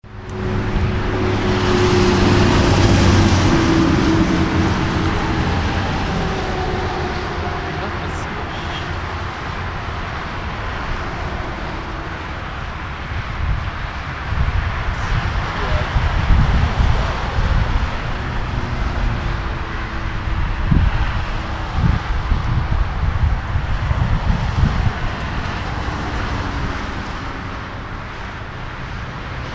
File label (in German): fahrende Autos